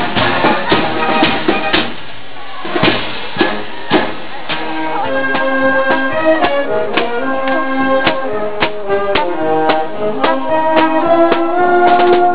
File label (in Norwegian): Korps; 17 Mai